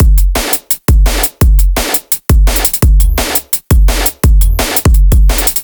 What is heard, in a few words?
Rumble Beat B, 170 bpm